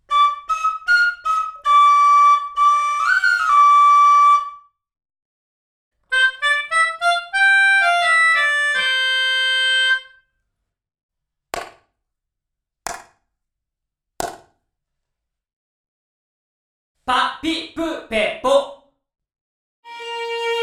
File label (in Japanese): ライブ・ルーム：Studio 2, Studio 1より少し残響が少なめで、バランスの取れたルームサウンド